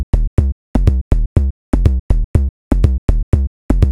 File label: House Groovy Sub Kick Tuned 122 bpm